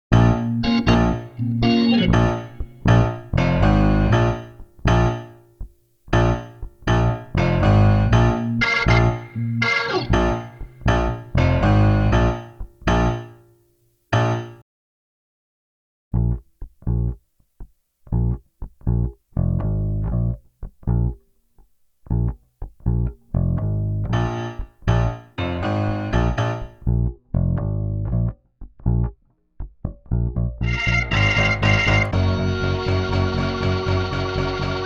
60s

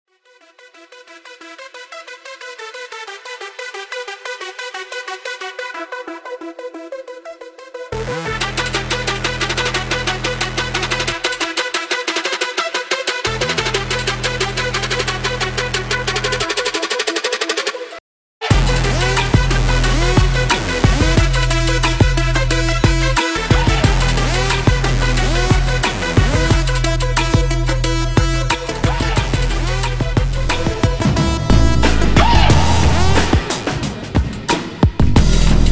Electro; OF FATHERS AND SONS – Soundtrack

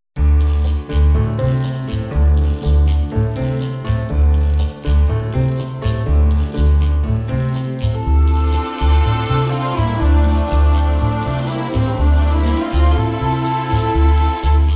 trop jazz

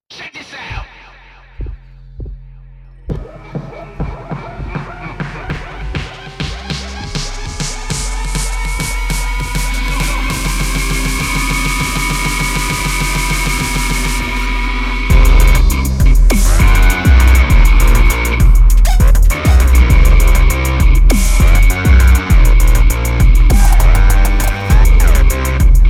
HipHop; OF FATHERS AND SONS – Soundtrack

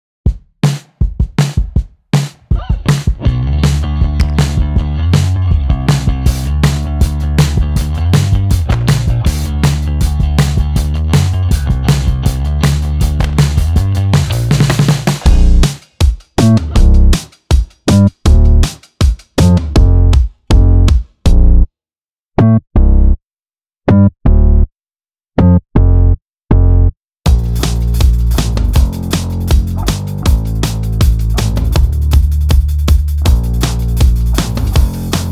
punk bass electro; OF FATHERS AND SONS – Soundtrack

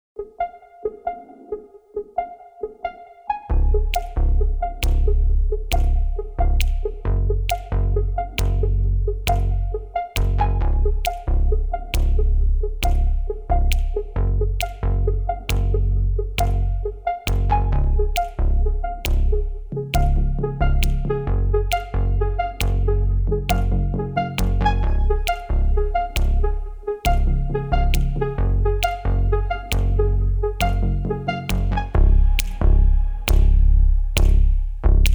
electro Pop Comedy

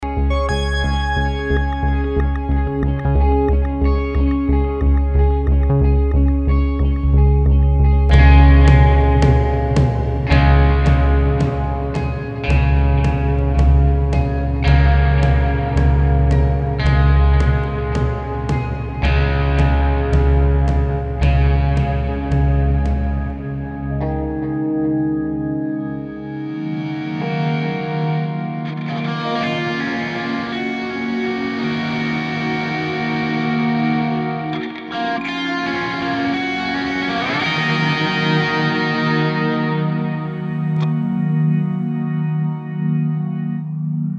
Electro Acoustic Pop Suspense

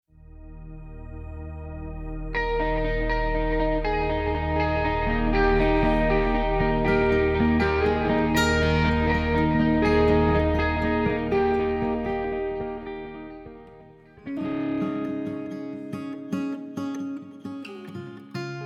acoustic electro Pop Comedy

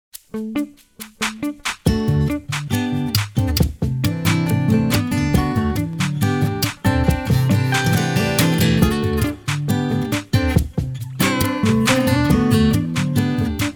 acoustic Pop Comedy

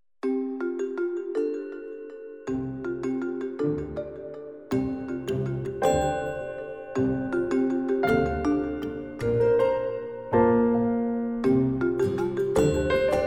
Mallets voll

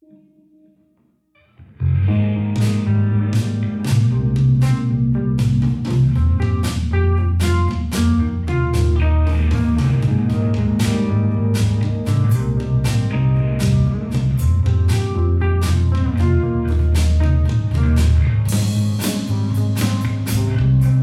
Emo Guitars Melo Bass mit Drums weniger; OF FATHERS AND SONS – Soundtrack

